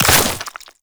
battery in, headcrab reviver; creatures

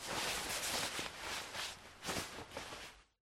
foley jacket

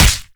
bullet, damage; player, damage

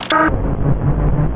train use; Half Life 2; plats

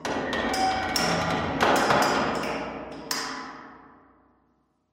ambient pipe bang, metal

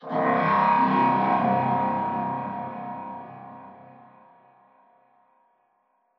strider distant; Half Life Alyx; combine; c17

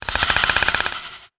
warning, antlion; creatures; vox

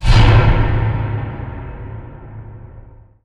ceiling stinger; Portal 2; playonce world

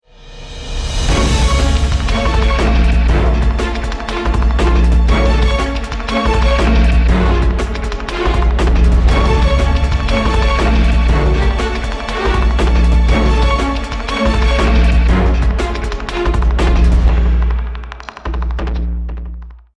start action, music

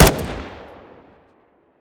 galil, weapons; Counter Strike Model Pack; CS2; galilar

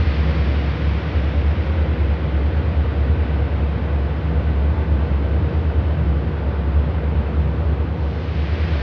interior, ambient; nuke

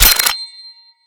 pistol foley slide lock; weapons; Half Life Alyx

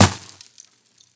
sand impact bullet; physics; surfaces

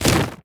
single, antlion wings; creatures

